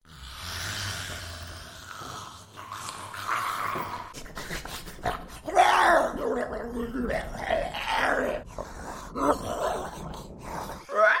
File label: Creature Sounds, character voice actor